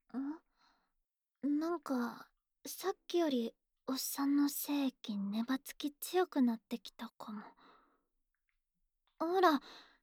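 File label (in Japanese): 口でのご奉仕（バイノーラル＋フェラパート）; 美味しくないのに嬉しいモノ♪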